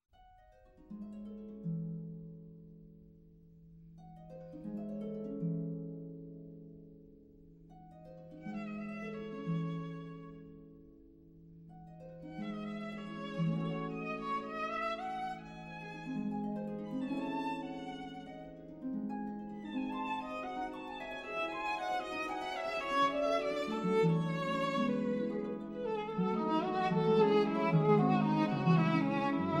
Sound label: Fantaisie For Violin And Harp